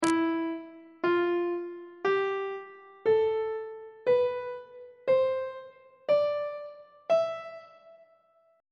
f flat phrygian mode note positions on treble clef